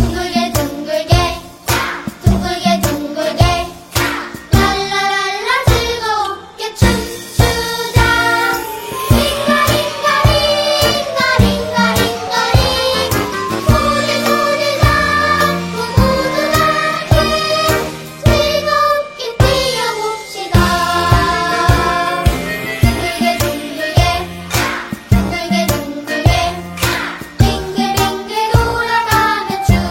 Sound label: Ringtone